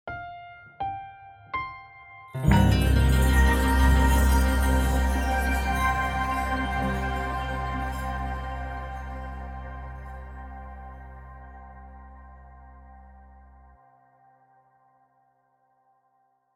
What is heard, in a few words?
Piano Flurry; love ribbon